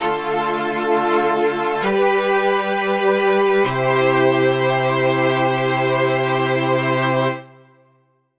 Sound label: string quartet